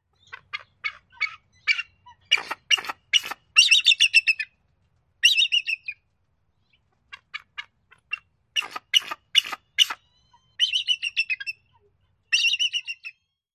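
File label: Bald Eagle calls of captive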